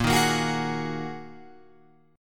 A sharp 7th Suspended 4th Sharp 5th, Guitar Open F chord; strummed; 10,10,10,13,11,13; JGuitar